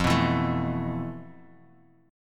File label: F Major 7th Flat 5th Guitar Half Step Down; FM7b5